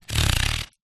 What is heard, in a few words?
sport plastic sports cone short scrape on concrete vibrate shake; zapsplat